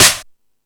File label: Rap Session, Drum Machines E-MU Pro-Cussion